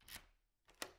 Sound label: key doors